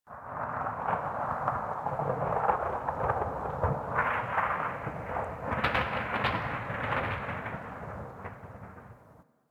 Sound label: Landscape Distant Avalanche, Ambience; Warframe; Venus Open World; One Offs